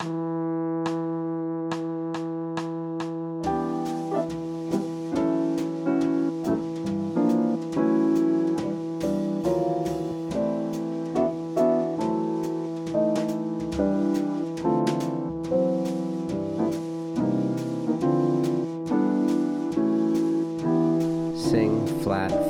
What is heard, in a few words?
E Minor Blues No Bass Tenor Drone; applied jazz ear training; Practice Perfect